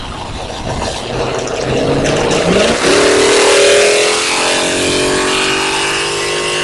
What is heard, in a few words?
x350 hit it